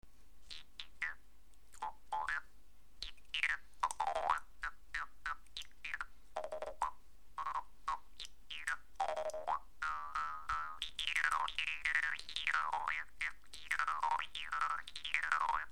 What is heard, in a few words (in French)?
guimbarde